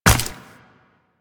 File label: Run Ice, Footsteps; Warframe; Tenno